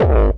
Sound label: bombo, kicks, Hardcore